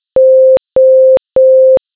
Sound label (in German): Besetztton; Signaltoene